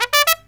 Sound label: TRUMP, Killer Brass Riffs; USB Soundscan vol.29 - Killer Brass Riffs; AKAI